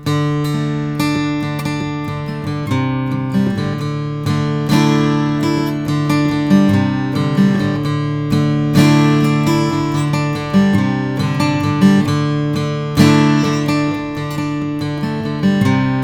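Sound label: om custom short scale natural, guitars; bourgeois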